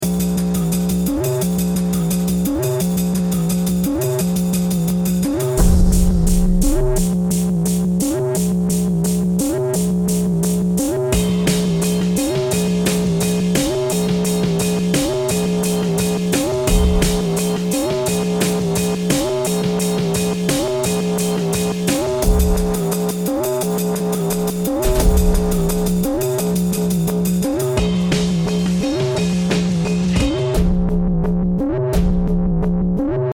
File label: start round, music